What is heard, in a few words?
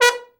TROMB; Best Service Gigapack 1; BR TROM